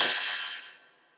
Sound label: Ride; FLPRO